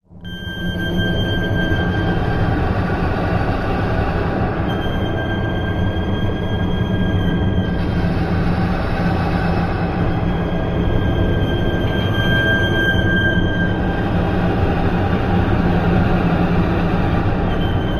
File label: Bell Scream shrill high pitched bell tones with ambient reverberate background